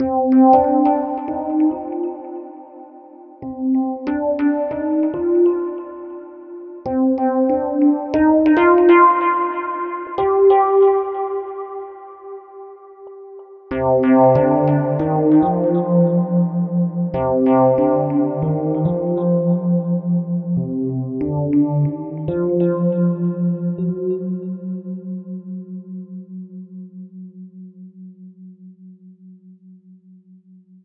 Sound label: Dub Melodic Rhodes; KJ Sawka; Drum n Bass & Beyond